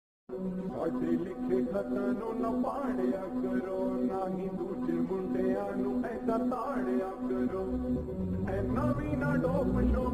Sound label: hindi ringtone